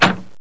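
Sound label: door close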